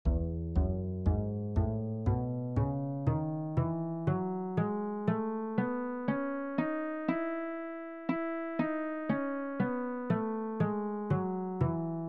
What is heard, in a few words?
E Maj Two Octave Scale Bass